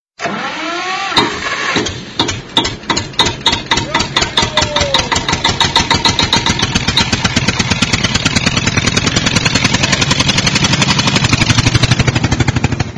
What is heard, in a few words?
Tractor Sound